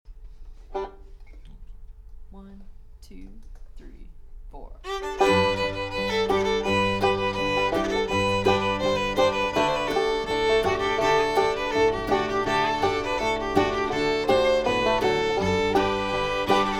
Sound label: Golden Slippers Slow Jam, fiddler